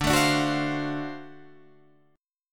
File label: D 7th Sharp 9th D down Guitar Open D